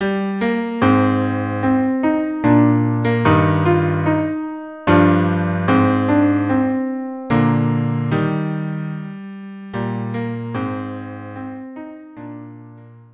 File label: jupiter violin cello duo; jupiter hymn from the planets violin cello duo